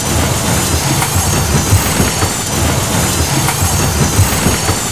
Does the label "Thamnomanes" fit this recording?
No